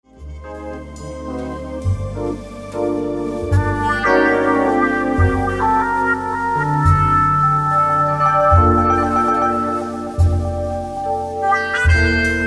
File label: Trumpet Wow